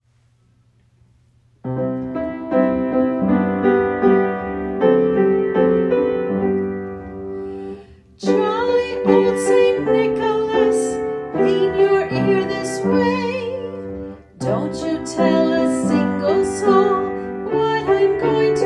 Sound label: Jolly Old St Nicholas vocal